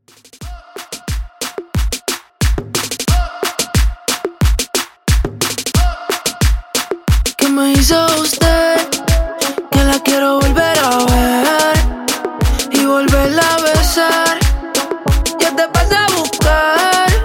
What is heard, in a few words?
Party Reggaeton, 90 Bpm